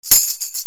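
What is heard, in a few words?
percussion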